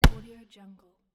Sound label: soccer ball kick